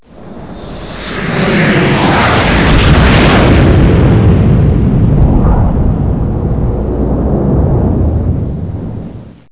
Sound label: jet airliner